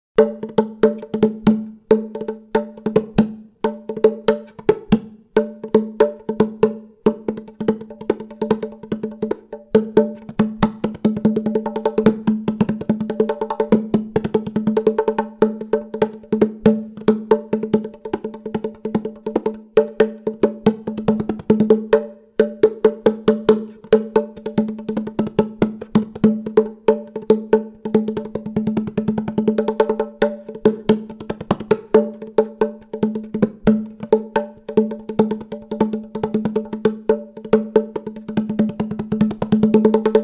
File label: Drum